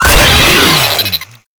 Player Spotted, Vocals; Enemies; Warframe; Proto Infested; Brachiolyst

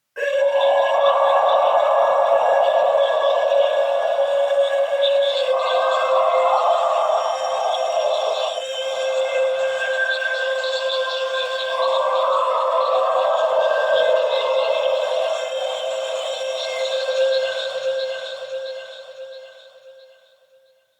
Choir; Warframe; Sentients

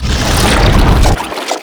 Octopede Lash Limbs Whoosh; Warframe; ManInTheWall

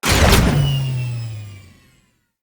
Dismount, Vehicles; Grineer Dargyn

